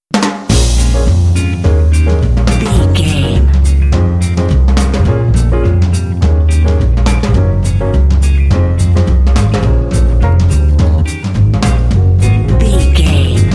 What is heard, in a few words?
jazz django swing bed